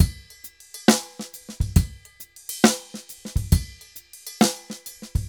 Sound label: Drums 136, Festa Latina, Drums & Percussion; Best Service; Festa Latina VOL-1